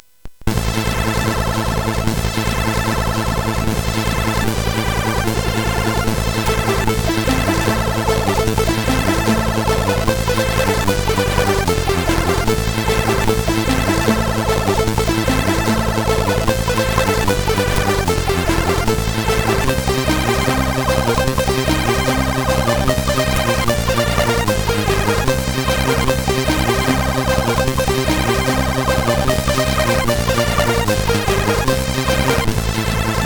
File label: 64, sid CSG8580R5; hvsc